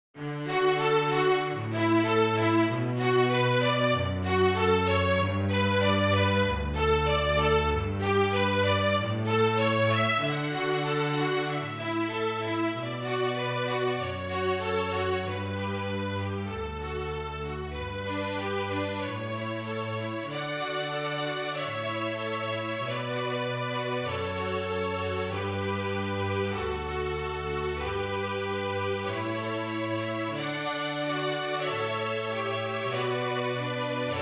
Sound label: Pachelbel Canon, Flute Trio Version